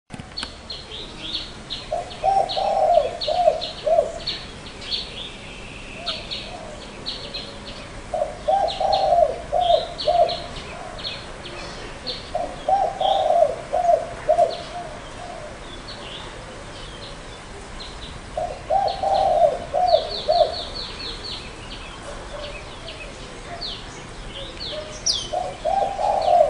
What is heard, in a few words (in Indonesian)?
suara burung derkuku atau tekukur